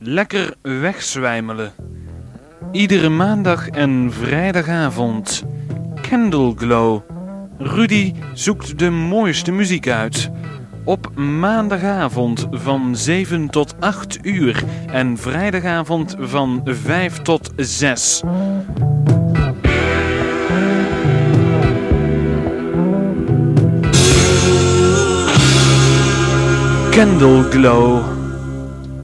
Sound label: Jingle; Allerhande